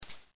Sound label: grenade pullout